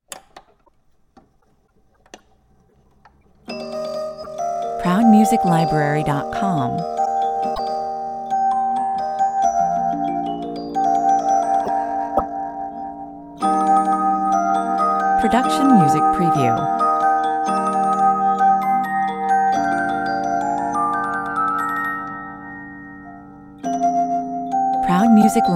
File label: music box, 1870 Schweizer Spieluhr; William Tell Overture